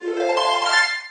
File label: chime